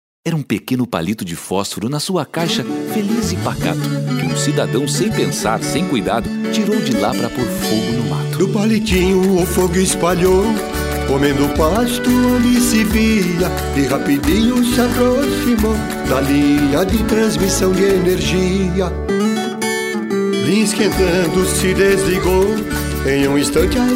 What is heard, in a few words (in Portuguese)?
jingle; palito